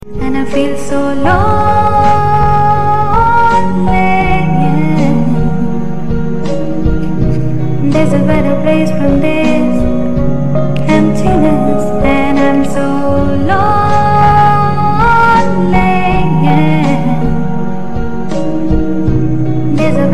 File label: Female